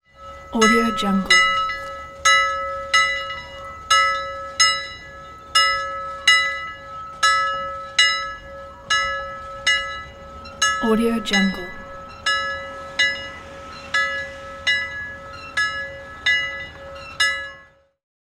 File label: bell ringing